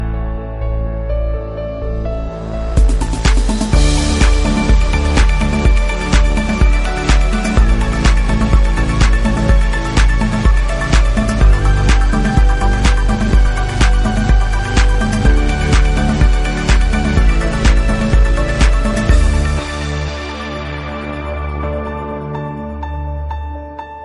music ringtone; Jolla

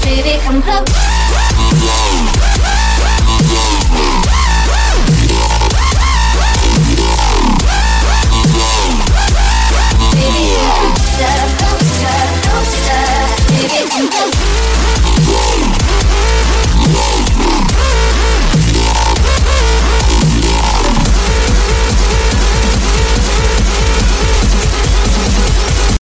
Dirty Electro 159

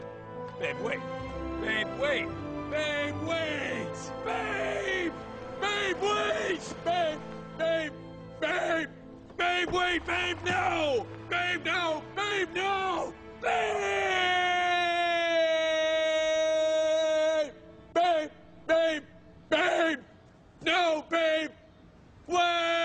hot rod music; latino

